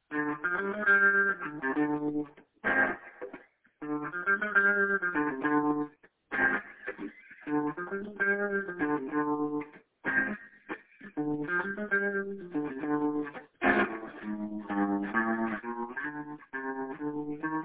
mysterious minor bluesy lick and chords

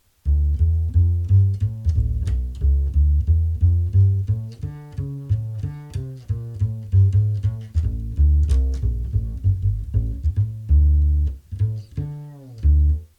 string Bass e602, mic tests

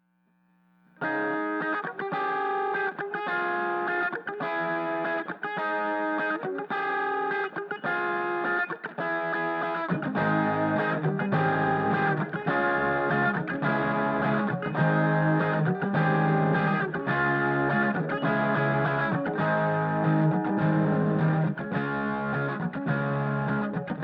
guitar Bus fx

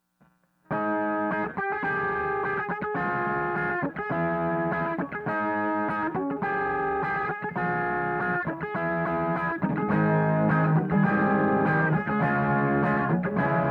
guitar Bus no fx

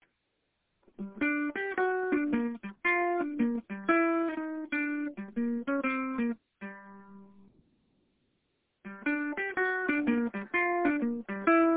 jazzy melody fragment

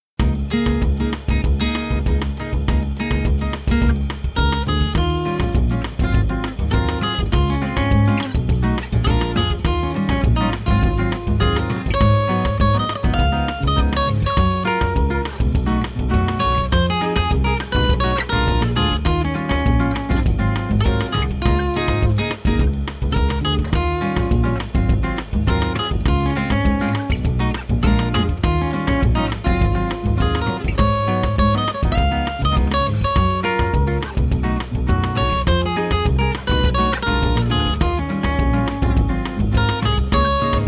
happy Sounding Bossa prog Parts; programmed drums & bass for now

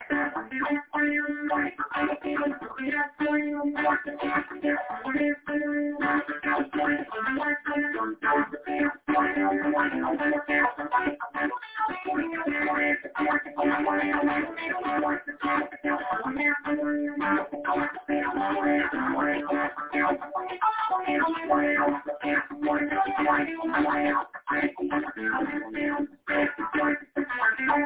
droning wah

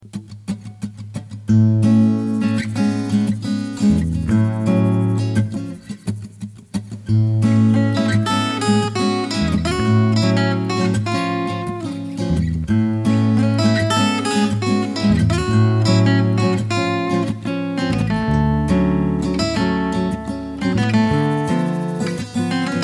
untitled bass perc harmony; full arrangement

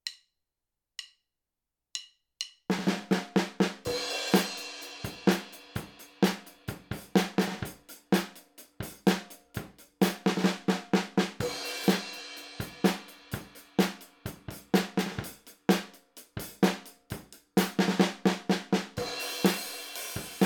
drums C3, mic tests

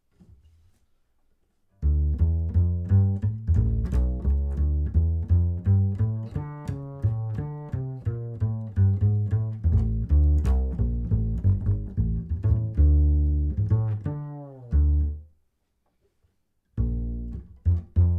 string Bass Spark, mic tests

